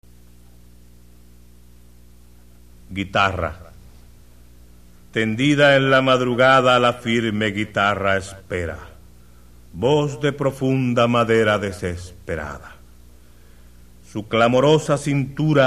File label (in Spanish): Guitarra